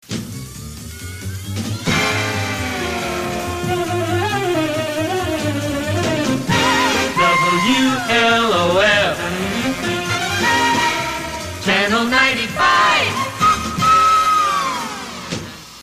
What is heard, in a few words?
horns; 950 WLOF-AM; wlof ch 95